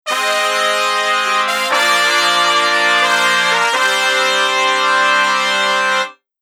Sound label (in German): 70er Trumpet Section